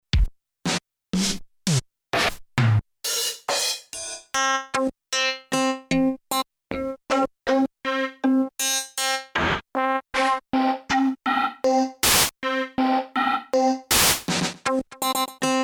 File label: pcm all, kawai k1r; all PCM waves